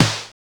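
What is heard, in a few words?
SN, snare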